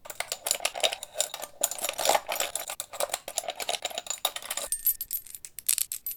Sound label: lockpick Loop; casualtiesunknown